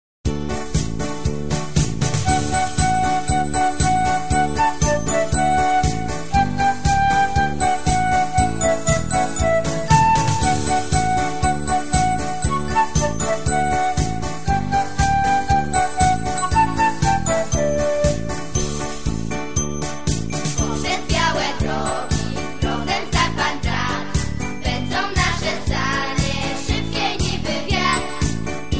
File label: Sleigh Bells